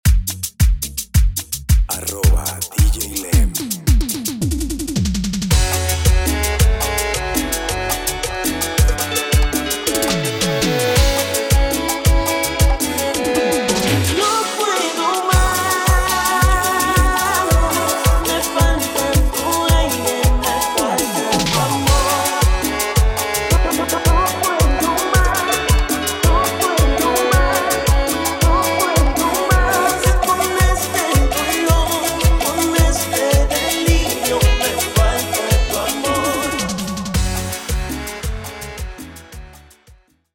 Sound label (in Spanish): Cumbia Kalle